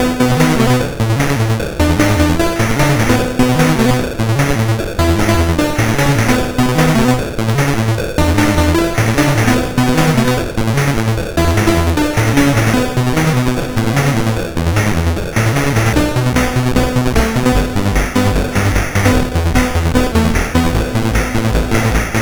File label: Synth Sample III, CSG8580R5